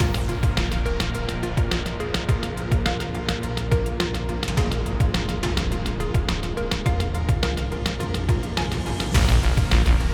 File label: Suspense BPM105